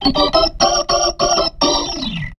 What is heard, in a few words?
B3 LICK D-F, PLAYED